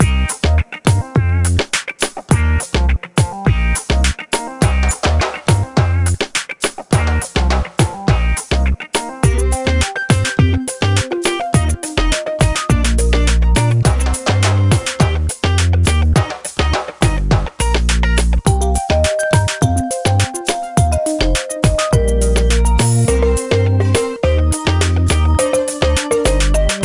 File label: Zouk; MTV Fan Attack